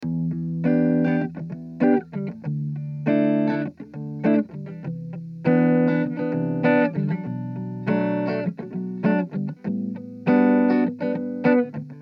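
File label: Em 100 Jazzy guitar Chords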